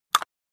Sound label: Bone Break